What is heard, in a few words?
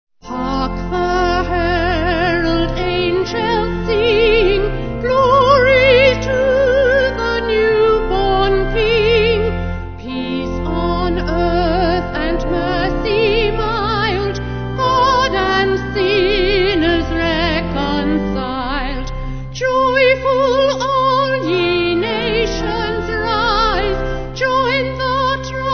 Vocal Organ